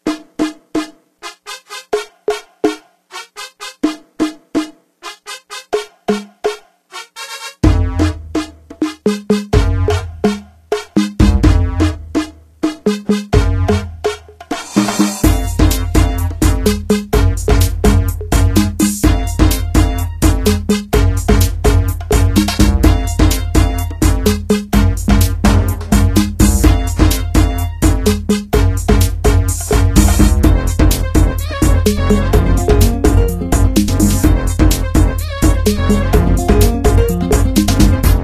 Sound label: Ragga Radio